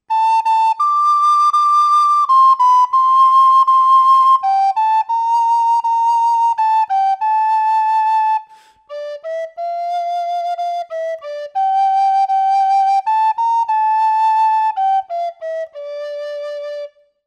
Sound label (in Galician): trad, Pito Galego